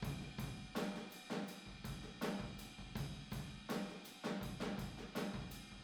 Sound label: Boogaloo Chorus Room ST, 82 BPM